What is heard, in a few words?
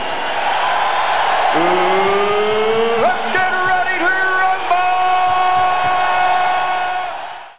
rumble; Lets get ready to rumble